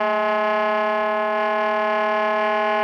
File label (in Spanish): Tremolos